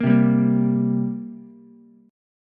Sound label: G Minor Double Flat 5th, down, Ukulele; strummed; JGuitar